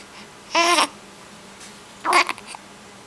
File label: chuckle